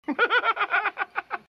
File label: laughter